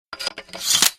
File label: rpg7 reload, weapons